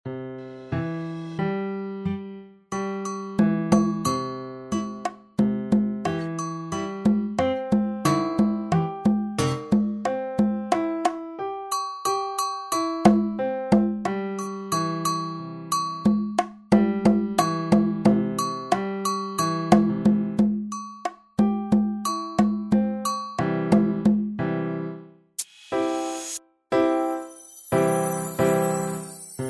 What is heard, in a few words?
National ANTHEM